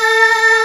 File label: MELLO CHOIR; WORS STATION; DANCE #3